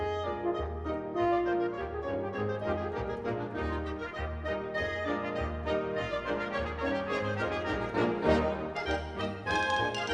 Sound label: classical